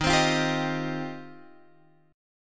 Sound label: Eb 6th Add 9th, Guitar, Open F; strummed; x,10,12,12,10,10